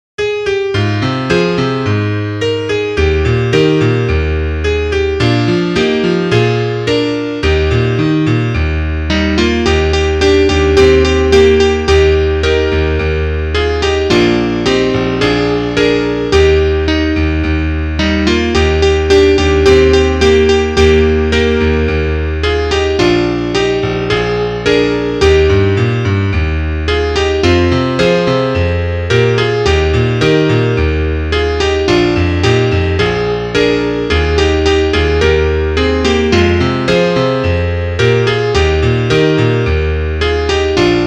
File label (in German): Klavier; Liederbuch; tiritomba